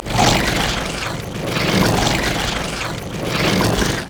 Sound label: plant enrage loop; Zyra; LoL